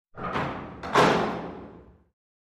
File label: Push Bar Door Open